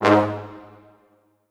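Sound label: Orchestral Brass, G sharp 1; Best Service ProSamples vol.20; AKAI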